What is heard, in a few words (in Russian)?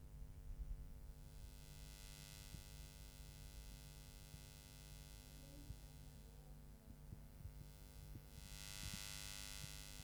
viklyuchatel sveta vblizi